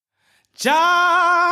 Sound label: Choir